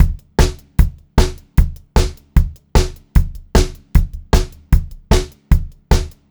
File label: BEAT, Pop-Rock Drumloops; USB Soundscan vol.37; AKAI; 90 s Sample CDs; 152 SPEED